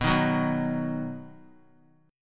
B Major, 3C,3F,3A,3D; strummed; JGuitar

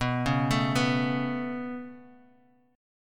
B 7th Suspended 2nd; B7sus2; arpeggiated; JGuitar

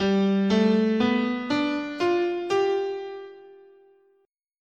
G Dominant Pentatonic, scale

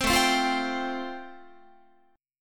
A-Minor 7th Sharp 5th, Guitar, chord; Am7#5 strummed; JGuitar

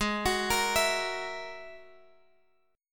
Ab 7th Suspended 2nd Sharp 5th, Guitar, Drop D

